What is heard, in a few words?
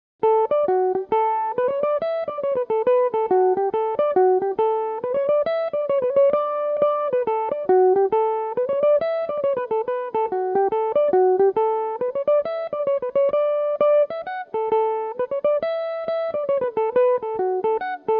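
slide d major, mandolin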